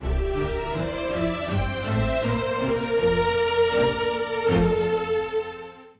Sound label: Strings F maj romantic uplifting melody